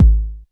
K909, KICKS TR 9+8

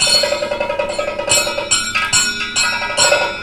KATAK, Indian Traditions

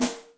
FX, Electric Snare, Yamaha DTXpress

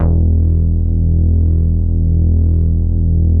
MINI, MOOG BASS

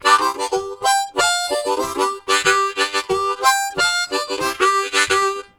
High, Harmonica Riffs; Zero G

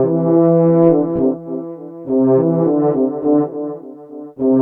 French Horn; Monkey Funk

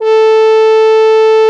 BUCK A4; BUCKET S+M; Xsample VOL-3

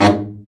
BRA BARI, Bariton Sax

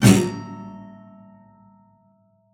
SPINET; Xsample VOL-7

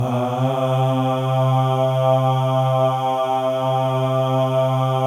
MALE AHS; Spectrasonics; Symphony of Voices

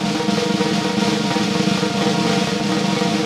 Snare Drum, Vintage; Q Up Arts; Johnny.C's Vintage Snare Drum; 6.5X14 LUD

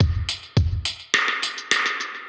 Beat C 105, 105bpm; musicradar; dub designer; Beats

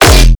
chonk, bass

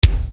Bass Dr, EuroDance Bdrums

